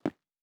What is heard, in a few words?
Footstep Concrete Running